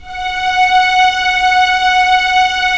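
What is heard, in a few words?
string section; Roland - LA Composer vol. 1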